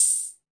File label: Roland RHY55, DRUM MACHINES